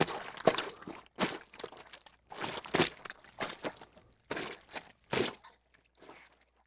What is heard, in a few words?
Footsteps Mud Liquid; Impacts; Sony Pictures Sound Effects Series Volumes 1-10; Sony Creative Software